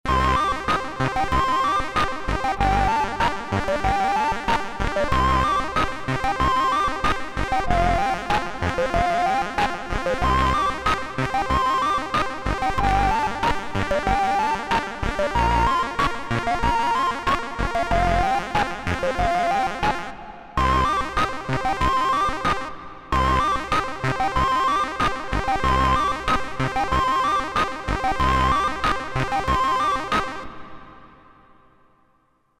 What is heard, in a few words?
wavetable song